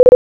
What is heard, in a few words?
Braille Chord Clock Tick; finn's sounds